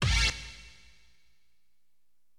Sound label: STAB, AcidJazz Funk Fx; LiveAct Pool 1